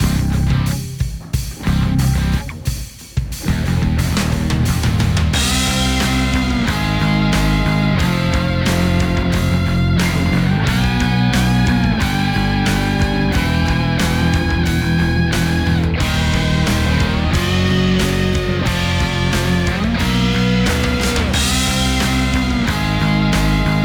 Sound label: Opponent Goal; Wario